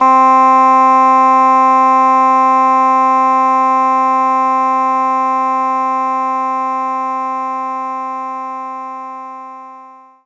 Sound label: double reed C4; knights try